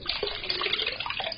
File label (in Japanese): 水, 生活音; 生活音系効果音